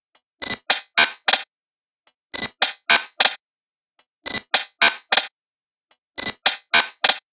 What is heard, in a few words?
Zippel SFX, dance